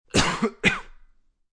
Human, Coughing, Male; Sick

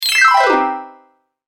Down, Bright Game Sound; Video Game Sound Effects; electronic sound effect

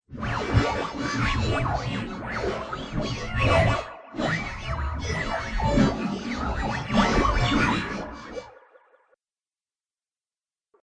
Alien Radio Tuning; Alien Sound Effects

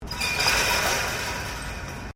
Wire Fence Impact; Material components to create more complex sounds; Hits; Objects

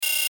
buzz digital lo fi; Buttons; Multimedia; Blastwave

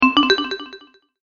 multimedia rollover, Multimedia Rollover Tone

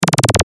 Chopper Click Burst

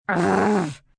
Growl Short F, Growl, female, short (human voice); Groaning Sound Effects

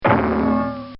Cartoon Sound Effects Bang; Cartoon Sound Design; Bobby Cole

